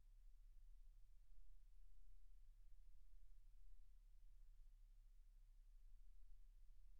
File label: silence 48kHz